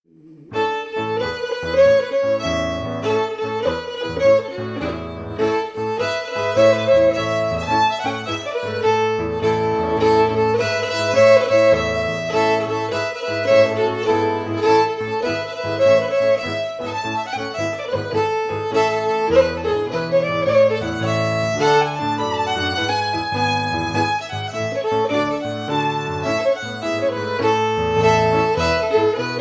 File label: FIDDLE PIANO; Jink & Diddle Book o' Tunes 2022